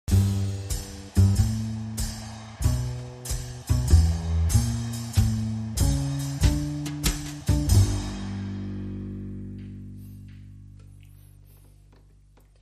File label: Jazzy O Christmas Tree